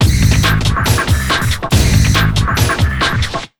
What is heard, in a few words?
TECH 140, Big Beat Drumloops